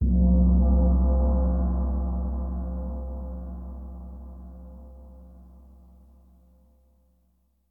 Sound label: Gong